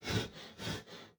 breathe; Rust

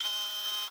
autoturret camera focus; Rust

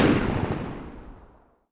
Inf Weapons; Red Orchestra 41-45

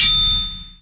head reverb

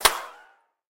bullet ricochet; War Thunder

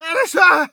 hit; Escape from Tarkov; scav6

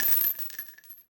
mg shell drops

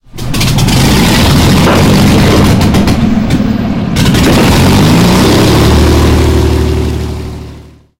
engine lancaster start; War Thunder; engines

